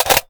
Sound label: ar reload empty magout, weapons; viper